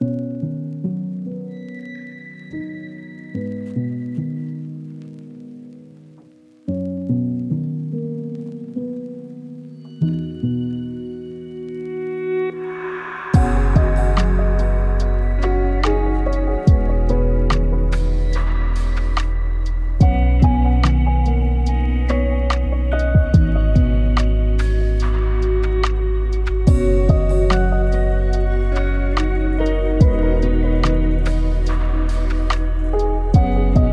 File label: Sonata for Cello and Piano, Ostinato Lento